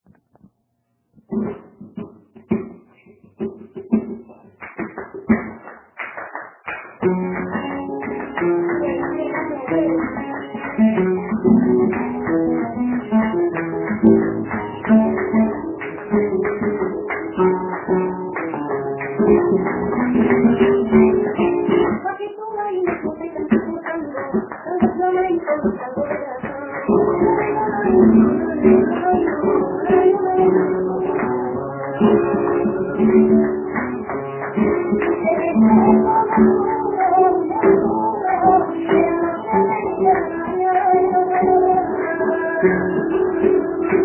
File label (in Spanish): Tangos, flamenco